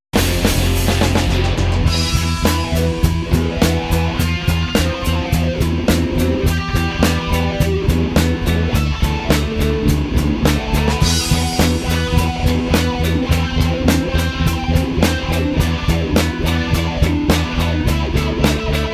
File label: solo